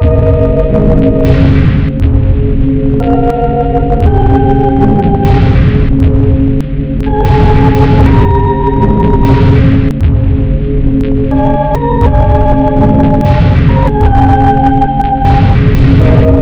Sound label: sine wave